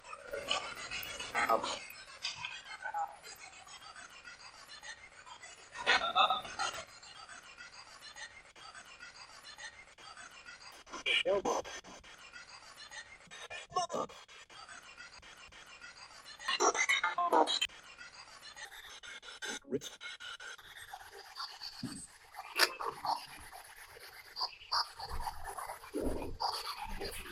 Blue Room Spirit Box; blennerhassett island; Put Num Putnum Killed by 4 Paul Rich John John; case study 4 investigations

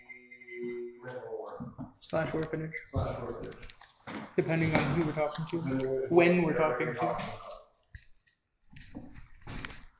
Mental Ward EVP; fairfield county infirmary return investigation

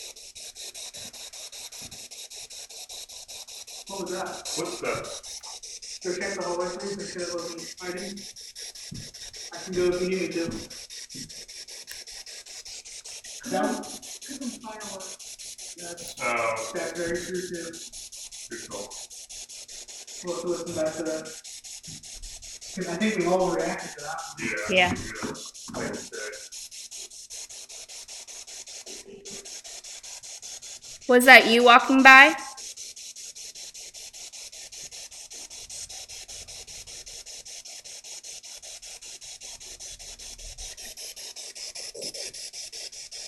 Ballroom Spirit Box

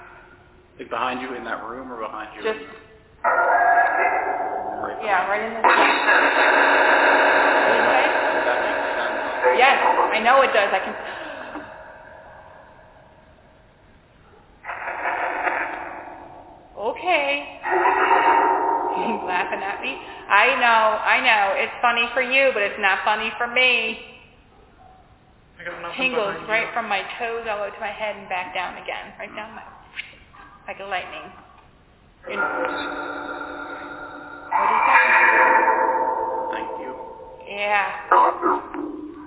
Phasma; Second Floor; martinsburg roundhouse investigation

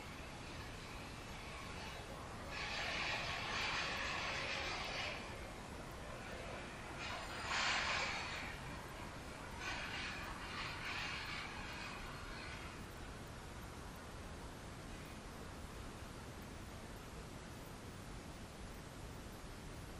Servant Stairwell Static; Irish-Townsend House – Investigation

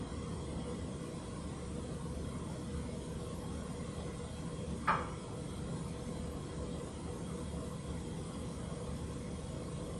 Inside Tattoo Shop

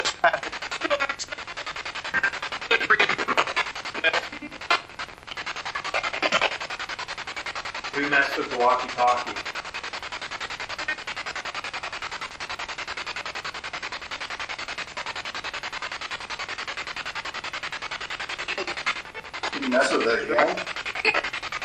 Spirit Box; 2nd Floor Hallway

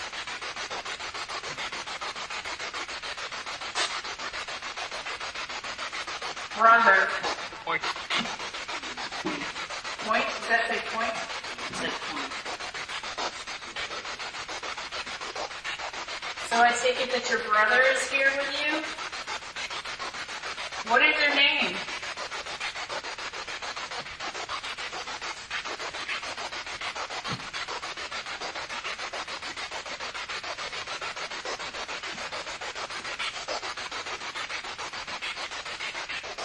Attic Rec Area Asylum Spirit Box

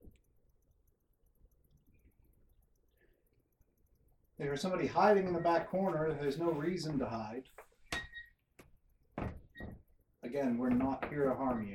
Basement EVP; wexford general store antiques investigation

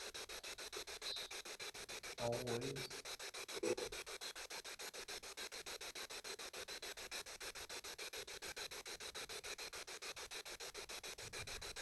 Spirit Box; american renaissance church investigation; At :55, a loud pound could be heard echoing from the back of the room.

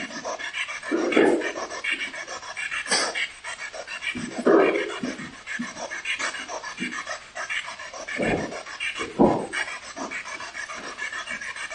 Bedroom Spirit Box

